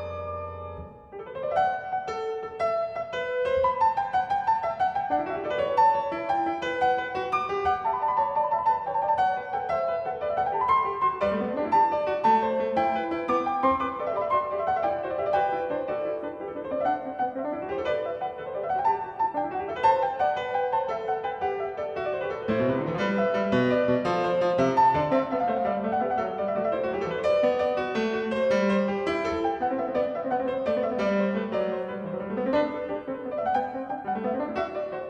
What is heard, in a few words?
Fugue in G major, Piano